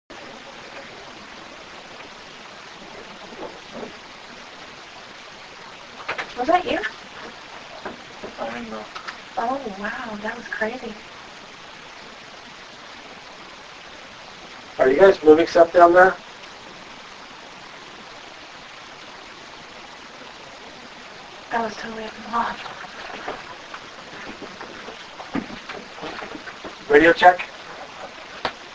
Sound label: violin case moved